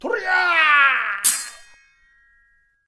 wow katana; jidaigeki

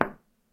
knock on concrete; knocking

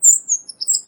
American bird

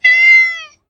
female cat

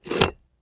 cigarette lighter; car

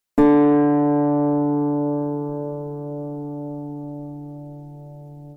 guitar

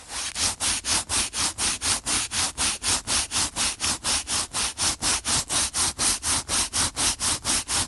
rub paper

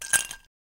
small ice

